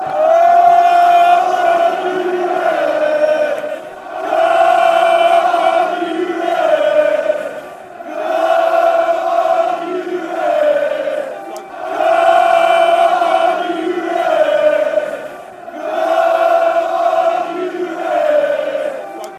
come you reds, chants

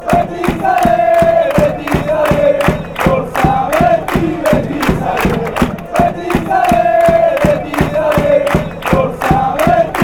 betis ale, fan chants

